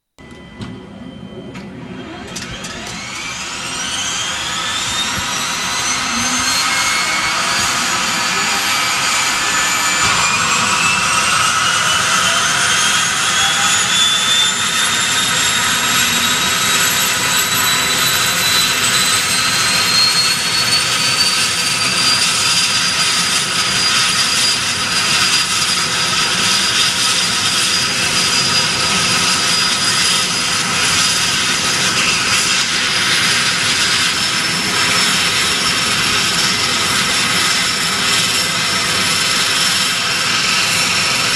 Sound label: Pilatus Porter Turbo Prop Startup And Idle